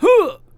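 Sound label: Mon Man ATTACK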